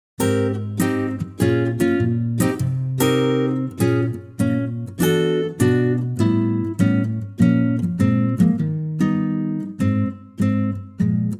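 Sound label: Ab acoustic guitar; key - Ab - vocal range - Bb to Ab; acoustic guitar arrangement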